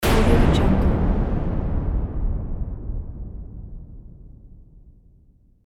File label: missile launch; hothead; hollywood edge